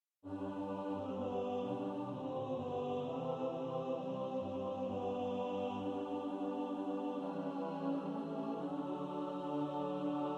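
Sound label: alto, practice music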